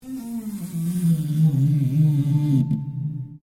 Piano Upright Broken String Slide Down Rubber; Upright piano without keyboard; Pianos; Musical

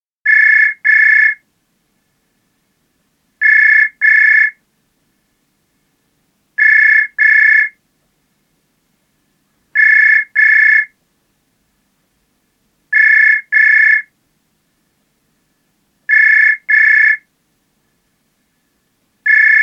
Telephone, constant ring (Push button telephone); Telephones; BBC

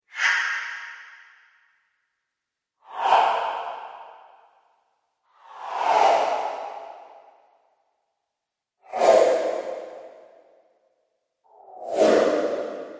Strong Wind Whooshes; Whoosh Sound Effects

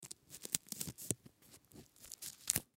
Bandage Apply, Bandage Apply To Cut; First Aid; Hospital; Emergency

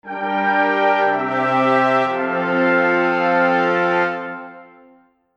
Fanfare Resolution; Musical

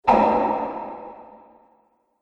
Haunted Reverb Hit; Ghost Sound Effects; Andrew Fleming

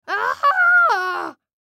Human Scream; Yell Male Seven Yrs Boy; Human Yell Male Seven Year Old Boy; Yelling; Blastwave

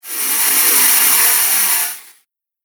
Gritty Projectile Swish; Combat Swing Sound Effects

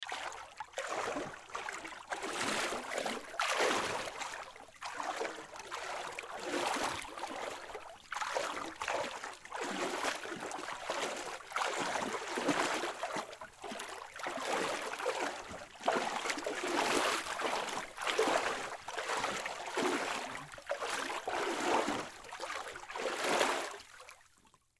Water Walk Wade Deep Movement; Blastwave

